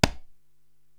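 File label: Conga Lo Muted Slap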